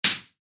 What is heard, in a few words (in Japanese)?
ムチがぶつかる 通常攻撃